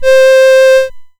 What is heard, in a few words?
1980s Casio Flute C5